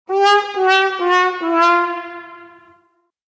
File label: Cartoon Sad Trumpet; Ni Sound; Toon World